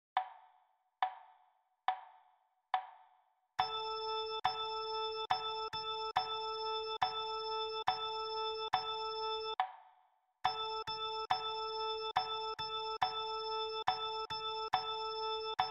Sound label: Rhythm Practice A note